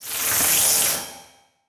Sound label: Transition Electrical Warble